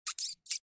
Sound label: mrat take hit; STALKER; customnpcs